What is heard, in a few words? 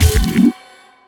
portal invalid surface, portalgun; garrysmod